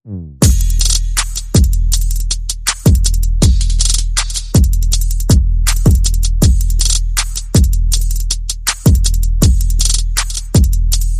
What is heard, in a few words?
Bass and Perc